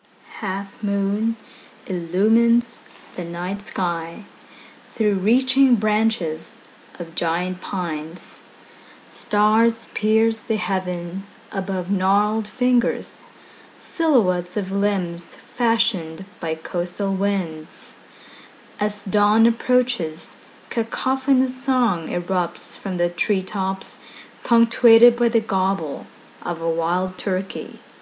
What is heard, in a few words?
dawn melody